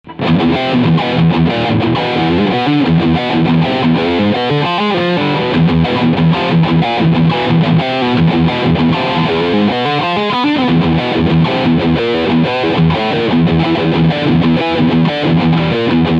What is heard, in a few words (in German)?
E Gitarre Riff Steg Pickup; Dean Guitars Exile Select